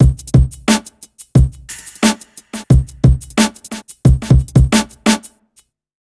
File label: beat, hip hop